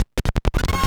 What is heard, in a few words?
SCRATCHES; Scratch 1